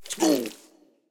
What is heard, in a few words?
hitreact small; minion s8; smite; npc; roman archer